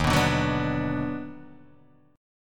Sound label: D sharp Minor Major 7th Sharp 5th, Guitar Open D